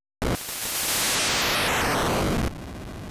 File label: Blast-off; mother1